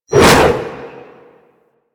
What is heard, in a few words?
Arch Wing Sword Whoosh; Warframe; Weapons